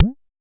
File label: Pollard Perc, Syndrum